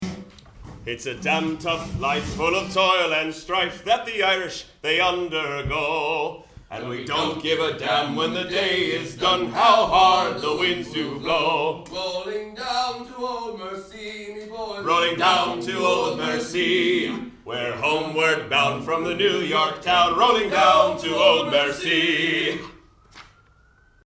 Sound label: Scouser Song